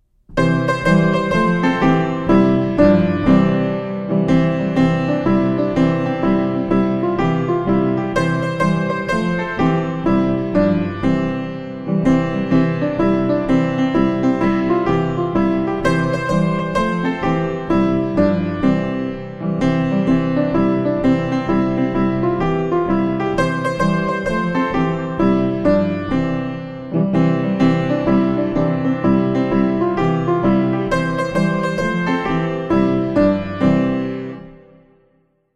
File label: Piano